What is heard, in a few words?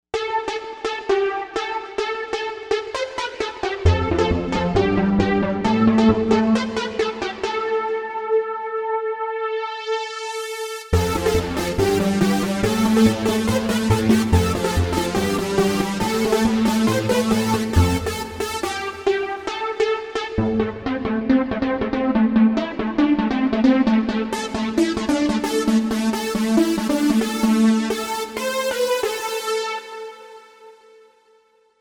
Euphoric Lead; Novation UltraNova; Factory Patches